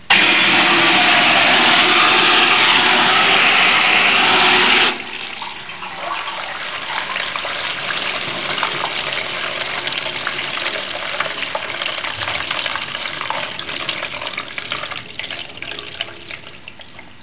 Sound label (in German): BECKEN